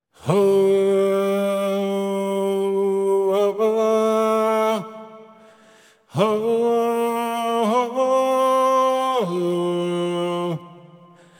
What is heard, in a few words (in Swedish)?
TENOR; popvis koren